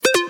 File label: new wrong